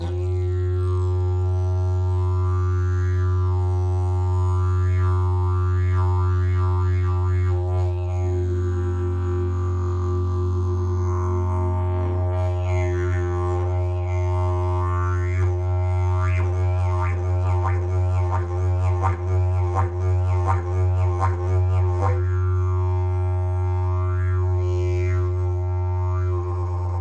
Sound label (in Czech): jasan, didgeridoo